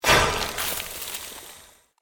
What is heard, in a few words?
Created Magic Spell Ice Bolt